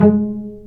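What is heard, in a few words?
strings cello pizzicato, dry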